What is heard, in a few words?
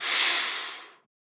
Breath Exhale; FREE SFX PACK VOL 2